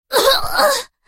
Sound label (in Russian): Последний кашель, звуки убийства; Звуки убийства в ТСБ роблокс